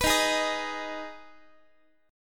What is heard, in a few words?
Eb Suspended 4th Sharp 5th Eb Guitar 4A 4E 5A 5D 5G sharp 6C sharp; Ebsus4#5; strummed